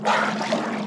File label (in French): souffle, globi